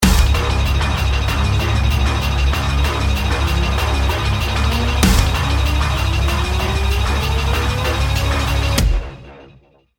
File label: bomb ten sec count, music